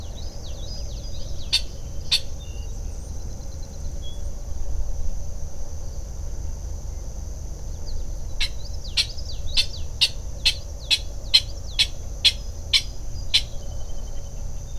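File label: Clapper rail kek; National Marsh Bird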